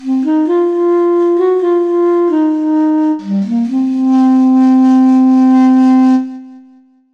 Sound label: XAPHOON